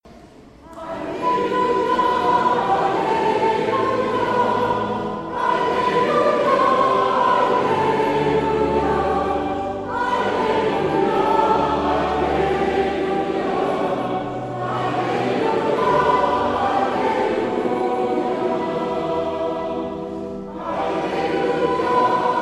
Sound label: Alleluia Tutti; Schutz